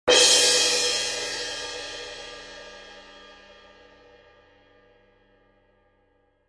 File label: 18in Classic Orchestral Selection Suspended, cymbal sound